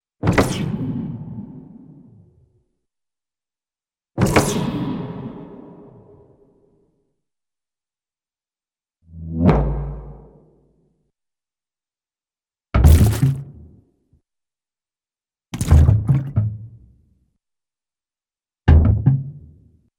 Reverberant Impact Object Dropped In Barrel, cartoon; Hollywood Edge; Cartoon Trax